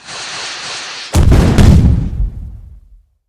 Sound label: meteor